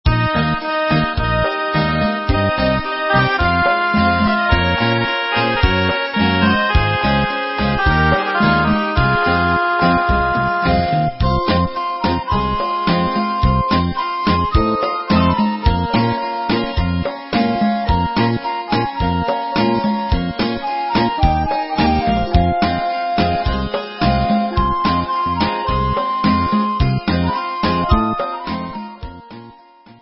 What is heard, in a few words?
Instrumental